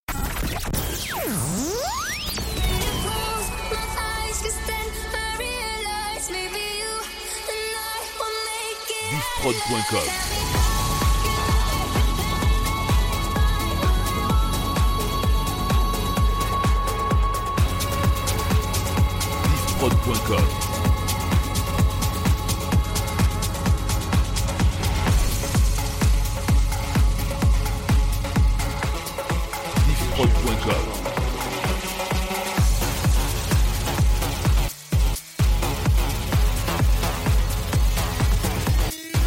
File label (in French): Medley Techno 128 bpm